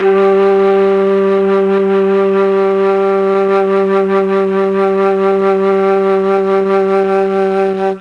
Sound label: G1 mellotron FLUTE